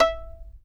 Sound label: fiddle pizz E5 R; Sampleheads; Whole Lotta Country 1 & 2